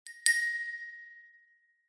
end ping timer

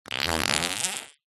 cartoon fart forced

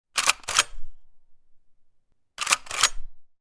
gun nova; still north media

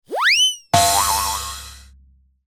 cartoon slip fall impact sequence

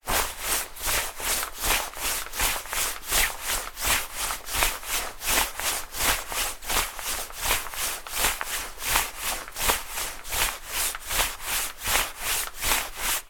coat jacket arm movement walking fast jogging

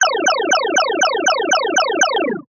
game retro laser repeat level complete

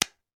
toy baby toy wooden puzzle pieces hit together

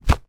water soapy foam splat hit

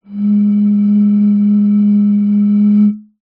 bottle beer empty blow air across top

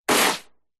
A Loud Crunch

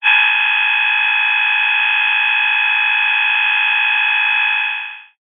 technology cb radio interference burst receiver; zapsplat

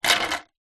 ice cubes movement slide in plastic tray; food drink

